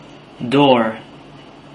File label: door